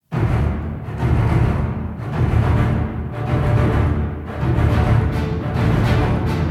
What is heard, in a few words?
dramatic music for theatre